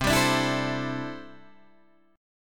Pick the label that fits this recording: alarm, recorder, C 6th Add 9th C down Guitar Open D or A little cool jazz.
C 6th Add 9th C down Guitar Open D